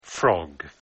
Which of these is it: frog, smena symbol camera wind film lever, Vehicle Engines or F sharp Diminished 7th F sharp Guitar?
frog